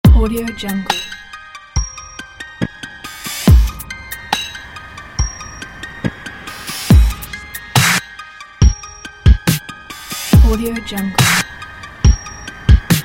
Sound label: Dubstep Jungle